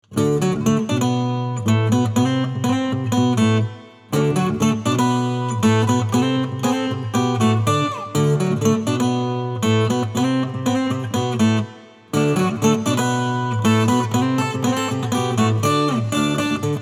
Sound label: Acoustic